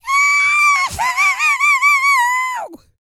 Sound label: YELL, MALE HUMAN; Spectrasonics Vocal Planet